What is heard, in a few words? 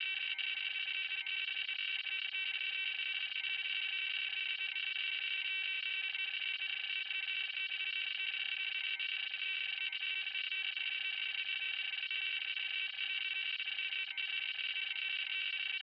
Data Transmission Or Read Out Signal Artificial